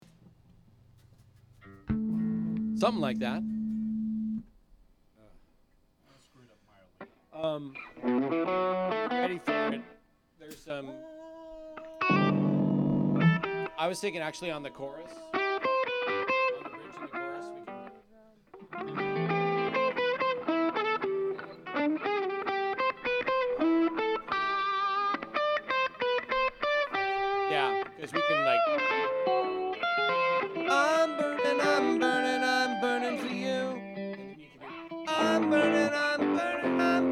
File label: Loud Mix